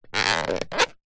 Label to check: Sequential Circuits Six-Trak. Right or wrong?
Wrong